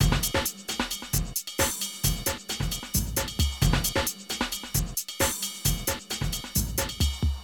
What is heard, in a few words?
Drum loops, Rave & Hardcore; Zero-G Groove Construction (1993)